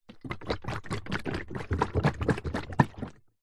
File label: industrial power steering fluid in plastic bottle shake